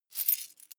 coins clatter heavy

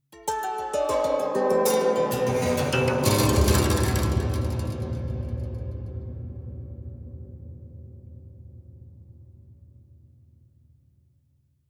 haunted strings down stroke slow hard

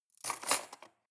cutlery cake forks drawer place

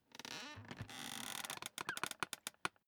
office chair creak sit up

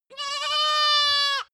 goat baa lamb classic

